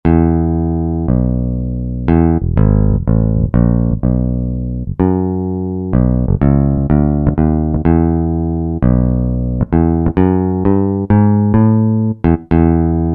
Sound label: Picked Bass, Roland SR-JV80-17 Country Collection